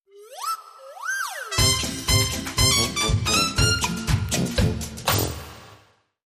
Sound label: Slap Happy